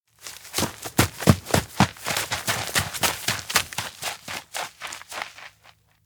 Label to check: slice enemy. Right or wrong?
Wrong